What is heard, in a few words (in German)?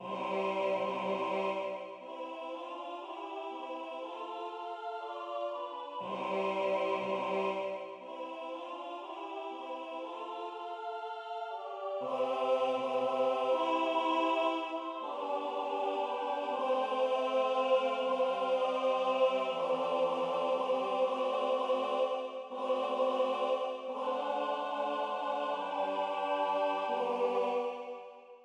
Gloria mit Soli Tenor